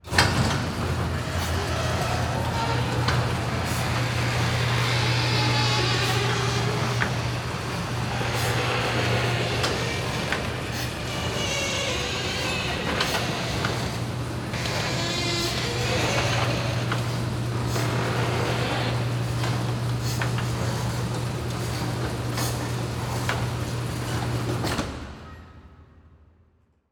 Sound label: Emergency Door Garage Door Of Fire Station Close Creaky; emergency effects; AdobeCC